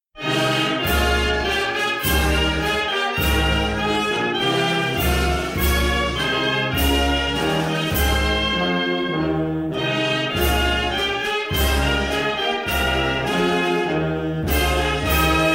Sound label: Anthem; Bahian